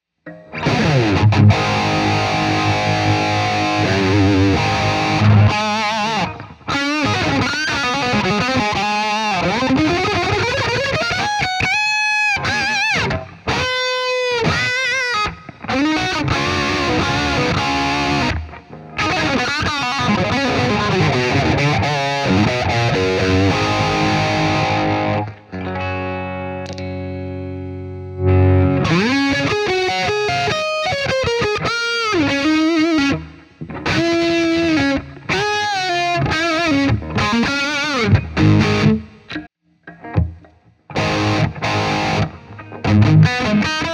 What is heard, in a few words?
noodling; afd100